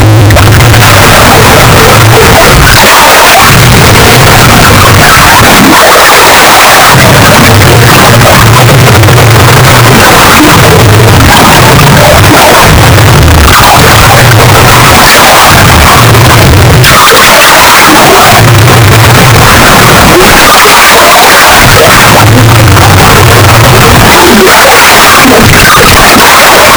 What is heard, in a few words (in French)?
mouvement d'eau profonde